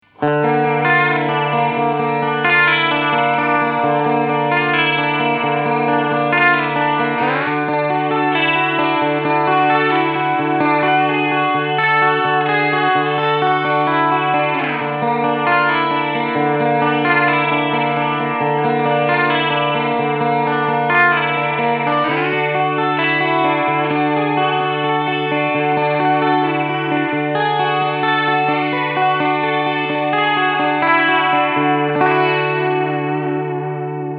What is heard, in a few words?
Echorec Head Modulation; Catalinbread; Head 1